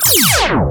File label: LASER, SPACE